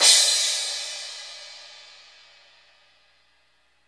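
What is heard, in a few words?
cymbal crash, Roland; Club-50 - Foundations Roland; Ext.Snare 4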